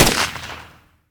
Revolver Shot; SNKRX

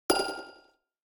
Bonus; SNKRX

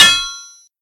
Sword hits another sword; SNKRX

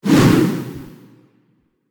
Wind Bolt; SNKRX

